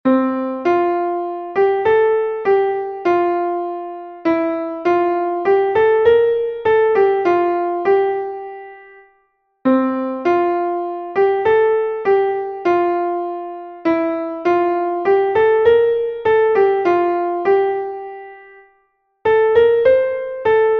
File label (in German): klavier; die bluemelein sie schlafen; melodiemeister